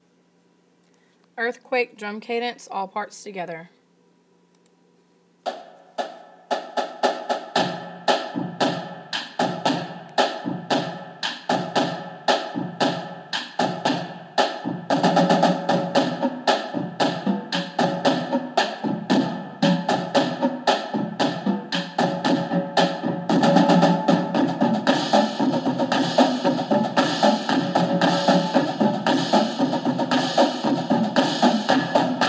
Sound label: drum cadence all parts; earthquake